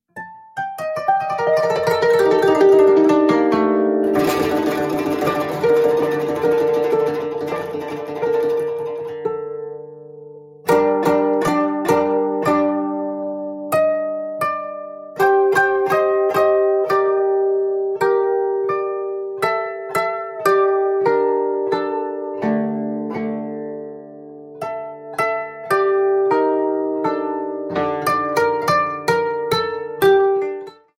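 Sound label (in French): koto, cordes pincées; le koto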